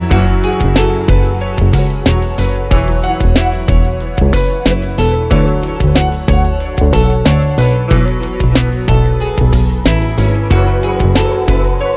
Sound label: ringtone trance